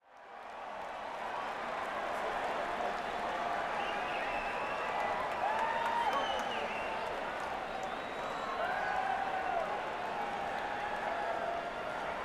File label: Crowd, Madison Square Garden; New York, NY